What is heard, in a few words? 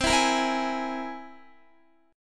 C Augmented 7th, Guitar; strummed; JGuitar